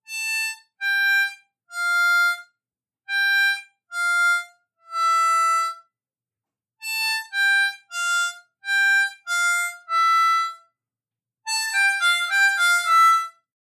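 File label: Triplets Descending, harmonica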